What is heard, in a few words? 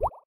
hold bubble tap; SKY IM-A870S-VEGA-Iron